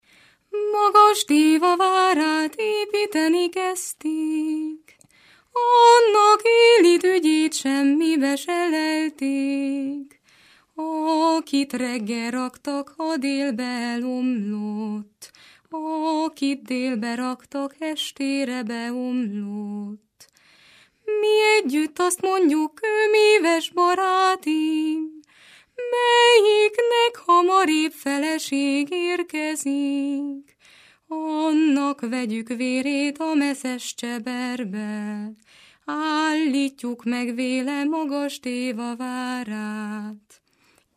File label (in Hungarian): nepdal; magas Déva várát